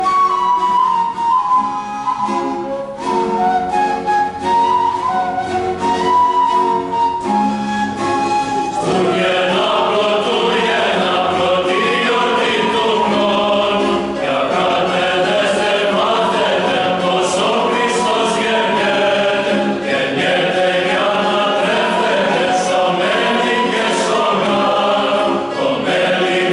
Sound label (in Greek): Κάλαντα Πελοποννήσου